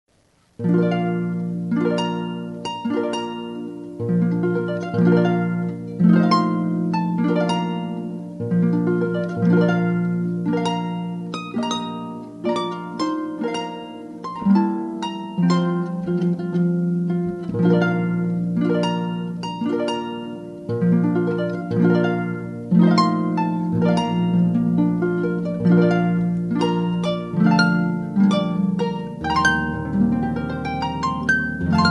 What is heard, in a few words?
Bridal Chorus